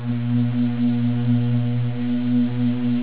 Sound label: HUMM